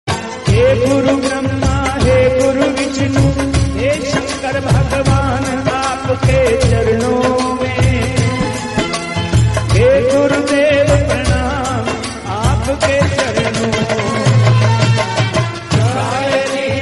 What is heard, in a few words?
devotional Hindi